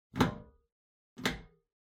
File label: Ceiling Light Pull